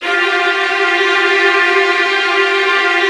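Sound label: Virtuoso Strings, violin trills, F1; ILIO; Kirk Hunter Virtuoso Strings VOL-1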